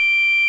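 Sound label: Farfisa combo G5, electric organs; E-MU Formula 4000 Series Vol. 3 – Analog Odyssey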